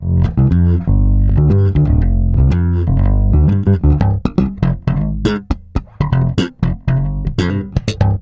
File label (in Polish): Gitara basowa, i